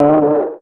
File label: TAP TONE, PERC; Crypton; DrumBase5000; PERC.COMBI